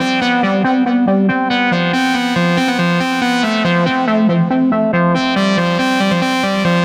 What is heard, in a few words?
NRG Arp, trance